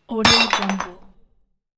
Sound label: broken glass